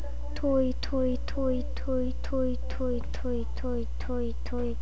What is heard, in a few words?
toy